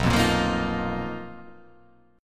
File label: B Major 7th Flat 5th B down, chord shape